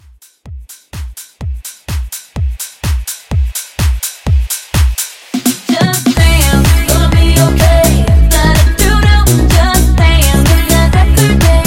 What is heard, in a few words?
House Mixshow Blend 126